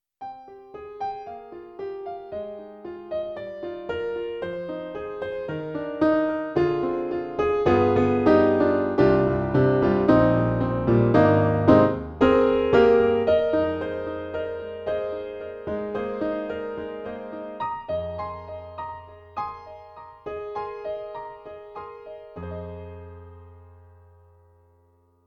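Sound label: Wide Ballad, piano; E-MU; Holy Grail Expansion ROM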